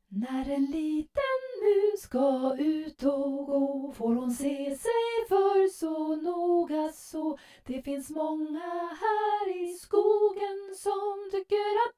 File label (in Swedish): farmors visa